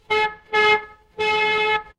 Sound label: mechanical horn